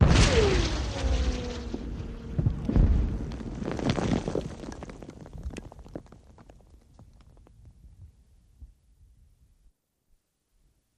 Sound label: Cannon Mortar Shot